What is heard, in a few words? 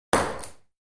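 Ping Pong Single Ball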